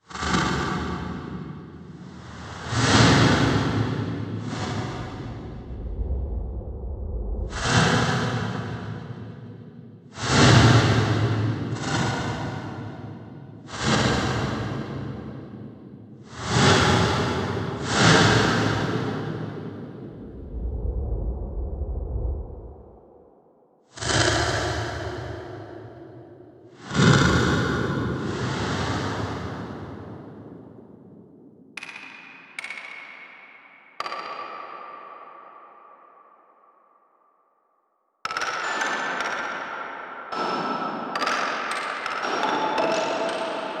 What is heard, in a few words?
Noise and Ultraverb